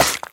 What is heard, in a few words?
spew, infestation, bulb